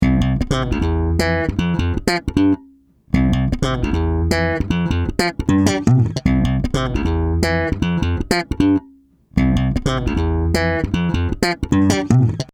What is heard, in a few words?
Bass LA2A